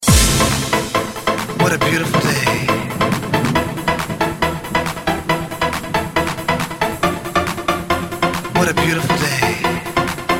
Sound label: Classic Trance